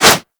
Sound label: Whoosh, Weapons; Warframe; Furax